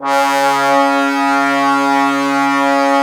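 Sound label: brass trombones C#, Woodwind Brass Ensemble; 90 s Sample CDs; Miroslav Vitous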